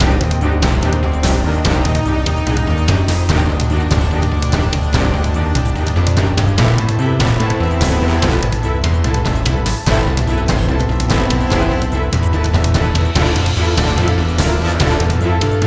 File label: Miniboss Level